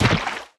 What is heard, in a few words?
mech armor land wet; Factorio